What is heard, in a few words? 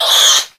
tushkano pain